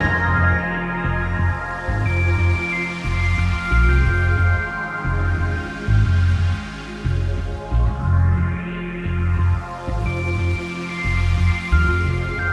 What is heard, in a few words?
Menu Loop Wake Up